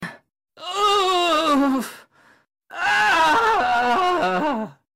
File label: ufff aaaa